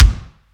drum hit whistle; osu

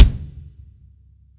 soft hit whistle

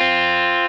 Arp.

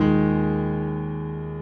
piano low

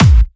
drum hit whistle

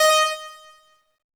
normal hitwhistle

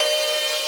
synth main

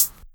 soft hit normal

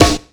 amen break snare

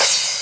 drum hit finish

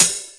drum hit whistle; osu

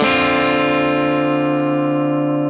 x 5 4 x 4 C#m7 Arpeggio down

